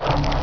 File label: pickup; amphetamine